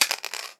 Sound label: drop tile; RS Games Sounds; Scrabble